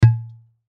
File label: Wine Bottle Half Full Uncork; Foley; Corks; Blastwave